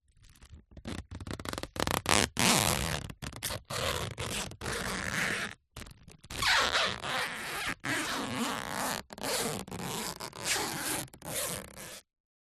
foil birthday banner handling rub with finger squeaks